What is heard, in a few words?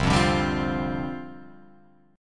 C Suspended 2nd Suspended 4th Guitar Open F, Csus2sus4 chord; Csus2sus4 strummed